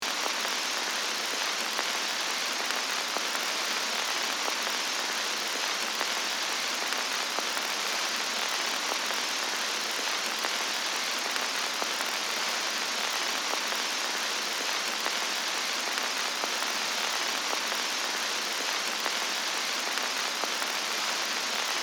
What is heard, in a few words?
Film reel old projector vintage crackle; film and tv